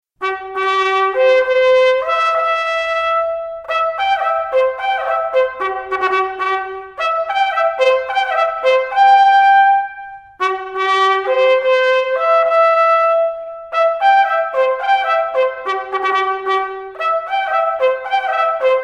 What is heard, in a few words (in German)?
Dammhirsch tot